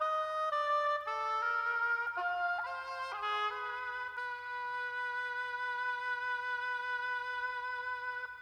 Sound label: keys, Sad Loops